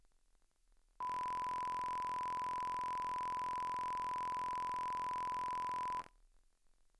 Loudspeaker uncal sweep

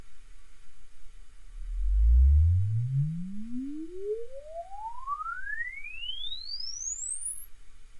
Alesis uncal sweep

